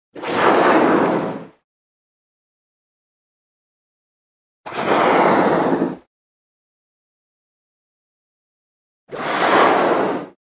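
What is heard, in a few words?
humpback whale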